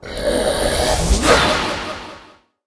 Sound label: warrior attack; monster